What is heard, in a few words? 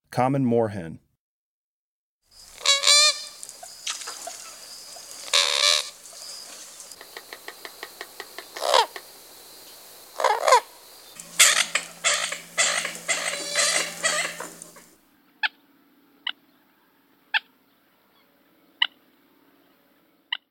Common Moorhen, Birds of the Chicago Region